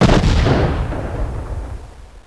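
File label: explosion; THEXDER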